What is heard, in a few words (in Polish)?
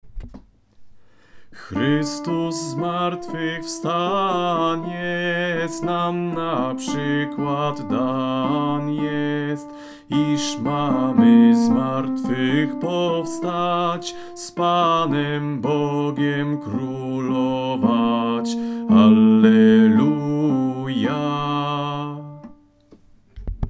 Chrystus zmartwychwan jest alt; pasyjne